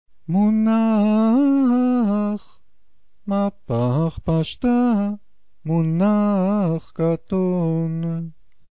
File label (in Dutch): Moenach mapach pasjta moenach katon, Sjabbat trope, Toralezing